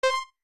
timer hurry; zsdx

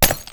smash, icicle; edgar; enemy

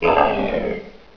pain

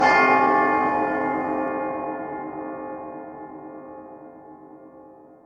bell; edgar

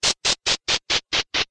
external stairs down; zsdx

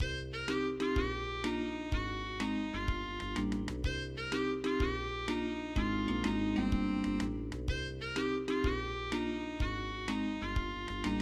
sneaky, music; commander genius

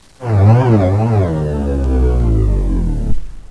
boss killed; zsdx

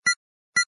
bomb tick; agent 8 ball

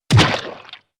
splat; edgar

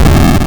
tank die; tanks of freedom; units

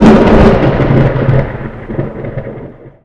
hyperdrive in; endless sky

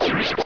enemy killed; zsdx

